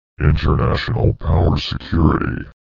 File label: Music Sound